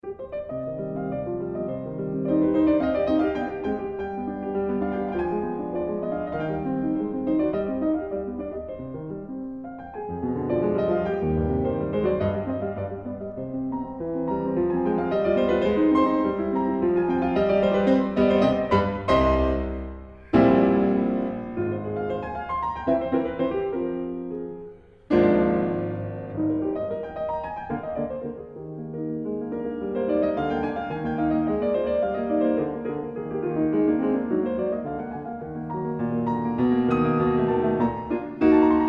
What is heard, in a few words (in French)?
piano sonate; francois frederic guy; beethoven; en public